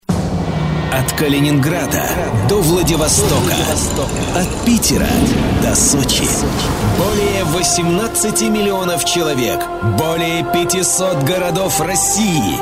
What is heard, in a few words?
jingle